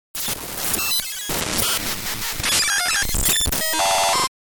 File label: midi